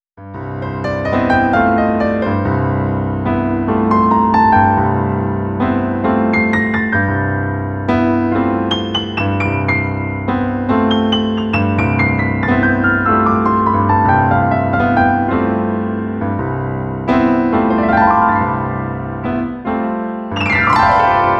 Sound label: true piano dry rev boost; cakewalk; Sonar 8 Studio